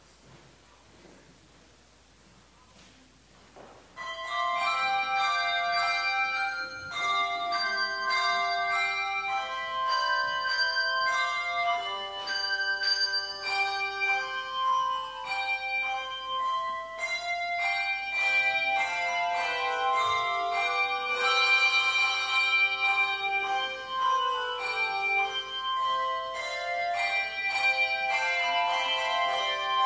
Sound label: Bells; Hosanna in the Highest